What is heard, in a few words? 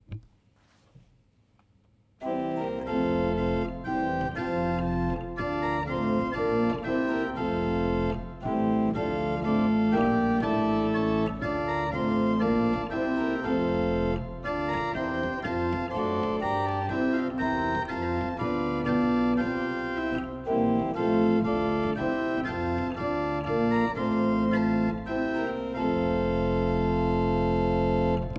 Walcker, pipeorgans